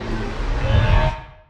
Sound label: Punch Left Speed Up; Pitch Black - A Detective's Demise